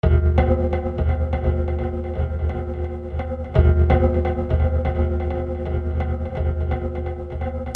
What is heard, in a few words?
RHYTHMIC